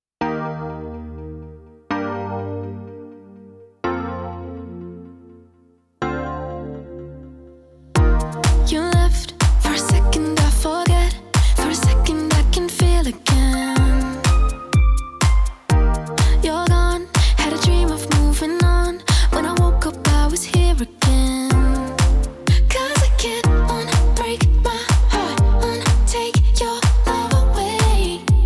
Sad Songs

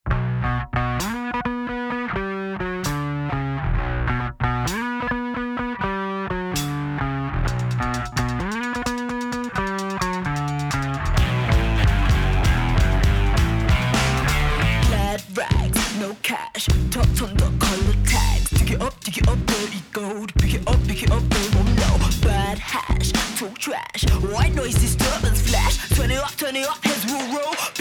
Bassline

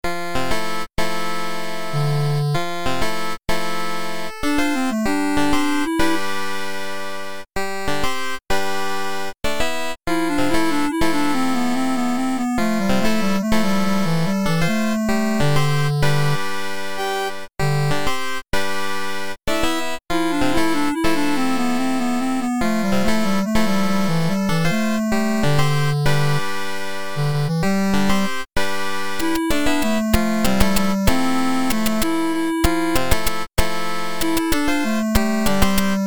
8-Bit Computer Game

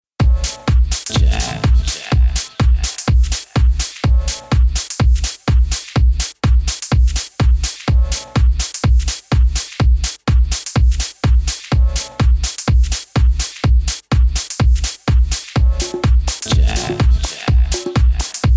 Djazz, lifted house

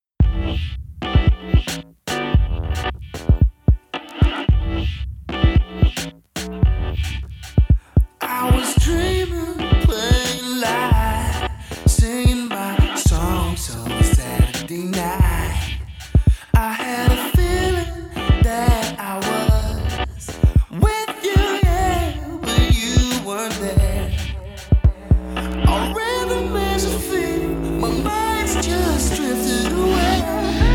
French Horn; lollapalooza

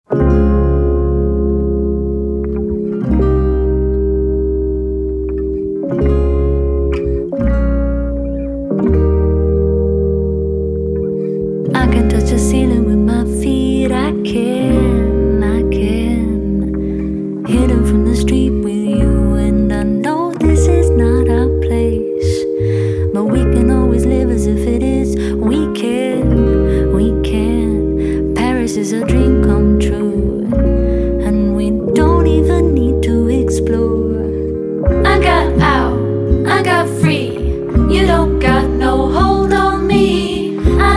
(acoustic)

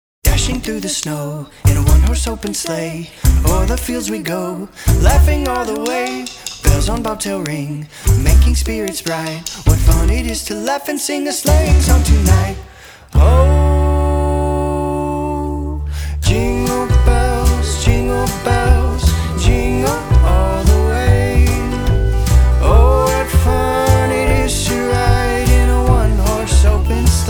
Jingle Bells; holiday

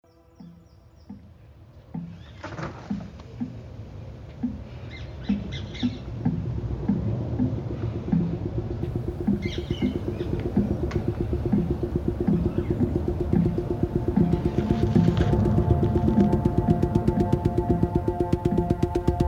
music for French horn and drain

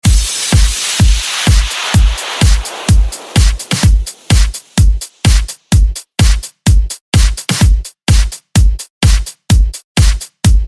Drunken Violin

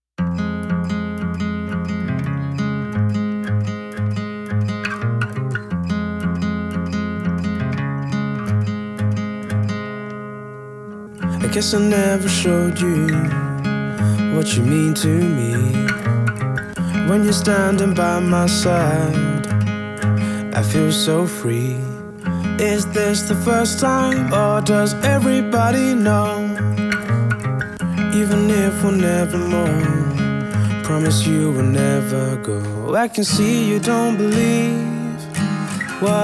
(Acoustic)

